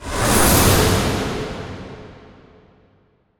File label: impact of words appearing